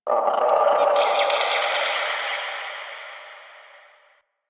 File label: Venus Landscape Cave Creature; Ambience; Warframe; Venus Open World